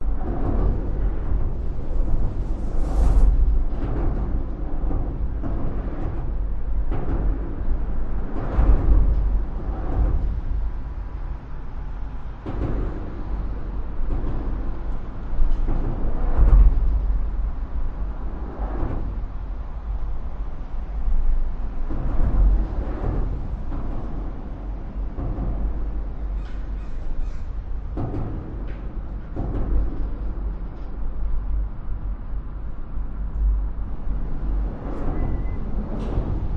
under bridge cars trucks motorcycles busses pickups vehicles traffic; vancouver; lions gate bridge; your free sounds